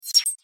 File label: notification; Tecno; M9